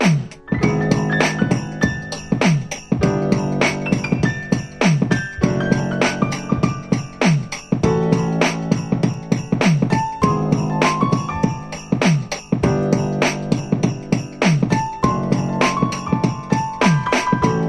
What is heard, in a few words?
PIANO RAG MUSIC